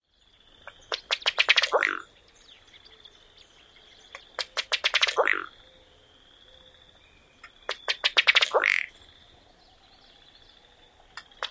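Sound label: sputtering call of male, Ruddy Duck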